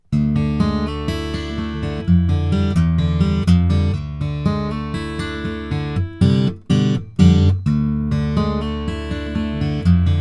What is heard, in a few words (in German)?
Fingers natural, Westerngitarre mit Tonabnehmer; FGC-TA; C-TA VT Vintage Tint Transacoustic